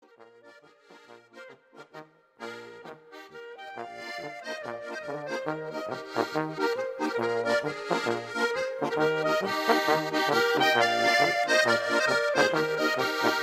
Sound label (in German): Polka